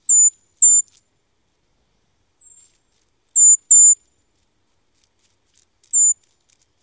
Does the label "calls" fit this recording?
Yes